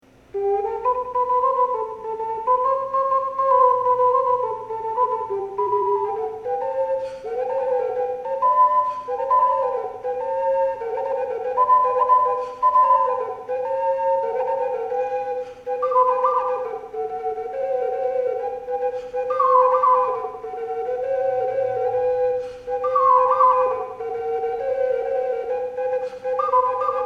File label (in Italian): zampogna, ocarina